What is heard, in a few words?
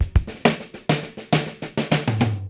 Fills 103; Zero-G; Monster Beats